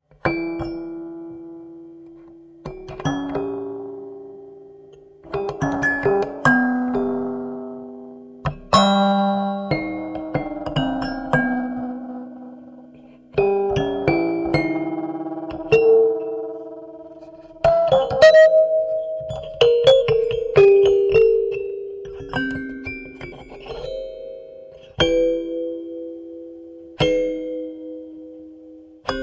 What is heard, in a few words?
claviharp